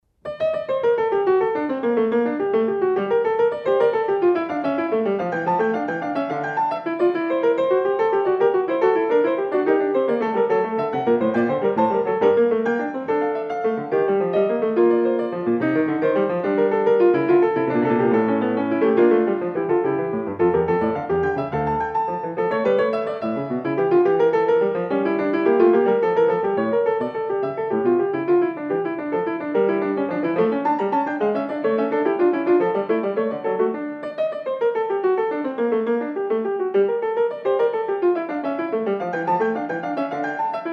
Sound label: English Suite No 3 in G minor, Gigue; Bach; BWV 808